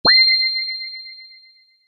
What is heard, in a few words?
Space fx pickup, Spaceship Environment Style Pickup, Short; Video Game Sound Effects; Video Games (Sound Effects:Games); Stuart Duffield